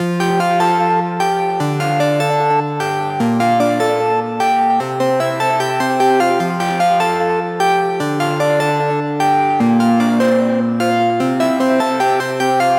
Melody F# 150 Goodbye, Color Bass Essentials, Melodic Loops; Ghosthack - Color Bass Essentials